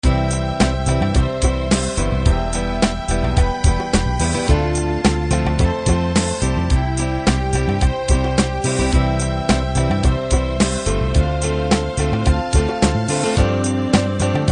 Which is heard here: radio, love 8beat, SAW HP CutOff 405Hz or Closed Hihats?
love 8beat